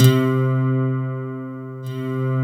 C3, SynthLine

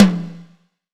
DRY TOM; EdgeSounds; Drum Mashines; R-8 DRUMS

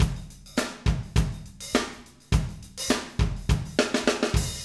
Acoustic Live Drum; Platinum Audiolab

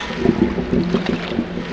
WET, WATER; AKAI S6000 CD-ROM - Volume 1

